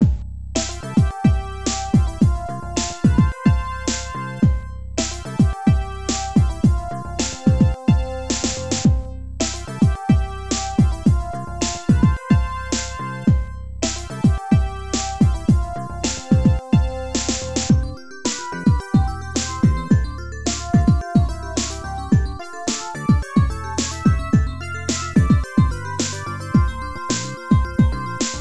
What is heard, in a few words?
In Game Bgm; mahjong fantasia i; x68000